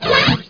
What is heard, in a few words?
Spell Change; HereticII; Weapons